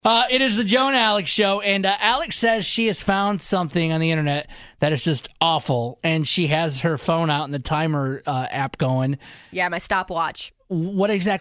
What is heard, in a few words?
cringeworthy mouth noises